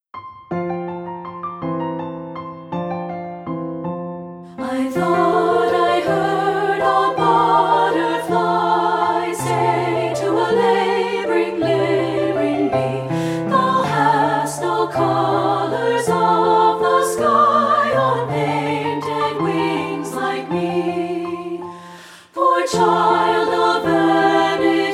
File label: Treble Chorus